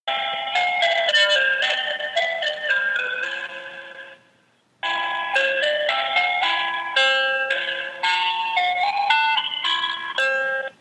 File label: on hold